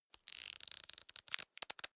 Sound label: small book riffle through